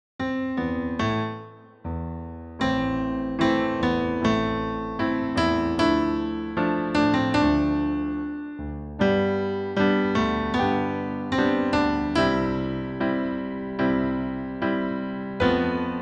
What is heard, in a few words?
solo for gent melody